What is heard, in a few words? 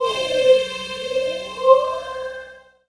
Dance Mega Synth; Best Service; 90 s Sample CDs